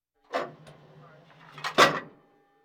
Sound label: Military vehicles